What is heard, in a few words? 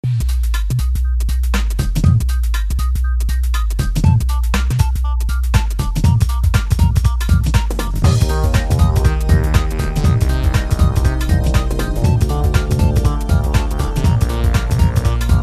JINGLE; BITJAM